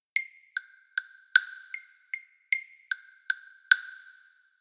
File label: Claves